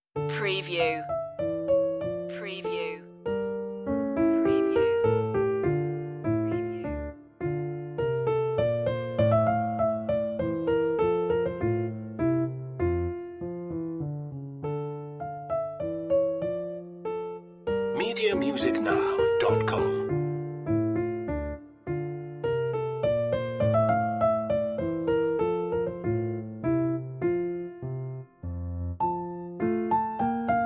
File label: bach minuet d minor; notebooks annamagdalena bach; bwv anh132